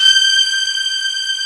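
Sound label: pop strings G4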